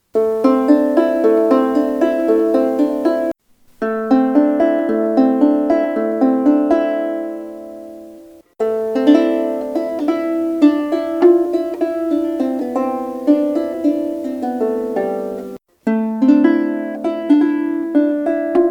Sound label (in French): lyre chevalet chene vs ambre